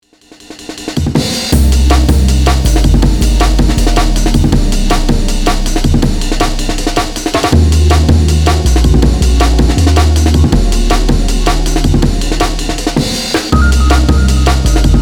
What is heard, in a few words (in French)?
loop